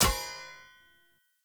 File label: Short Sword Medium Impact Metal